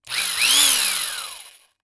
Industry Tool Drill Drilling Short

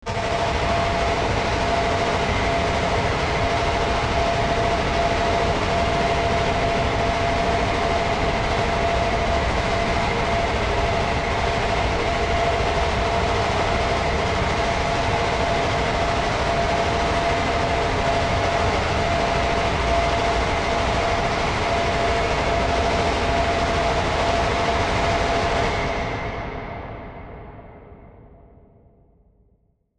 ATMOS, Cinematic Sound Effects; 99Sounds

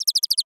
Animal Bird American Goldfinch Chirp; animals; AdobeCC

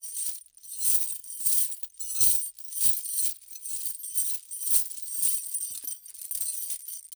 Foley Chain Metal Chain Rattle

